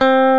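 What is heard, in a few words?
C STEEL GUITAR; Northstar - New Gold VOL-1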